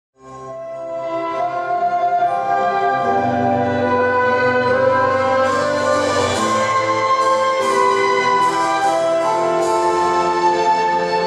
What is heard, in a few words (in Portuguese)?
ORQUESTRA